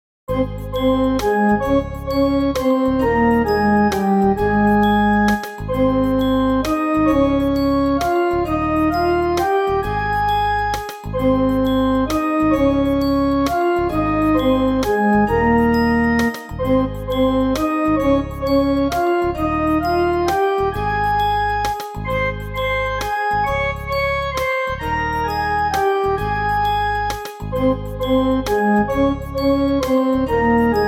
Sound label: Humpty Dumpty Karaoke